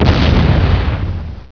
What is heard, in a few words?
hit us, explosions